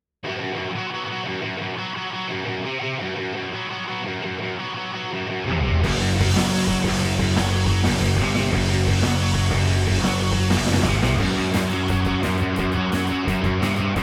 w Guitars

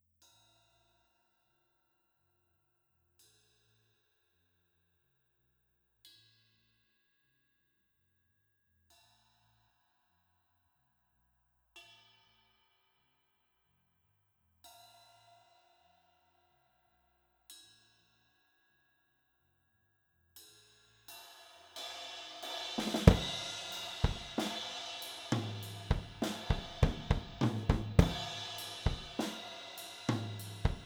AKG414 Drums

